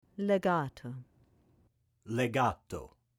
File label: Legato